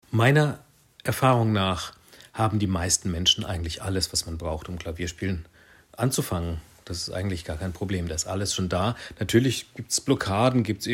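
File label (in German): klavier antwort, piano answer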